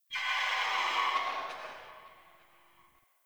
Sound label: Tree Distant Critters Angry; Warframe; Duviri; Ambience